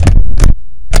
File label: loud revolver world reload